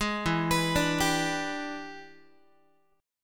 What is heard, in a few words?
E 7th Sharp 9th Guitar, chord; E7#9 arpeggiated; JGuitar